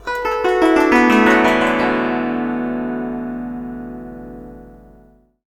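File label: guzheng; Spectrasonics; Heart of Asia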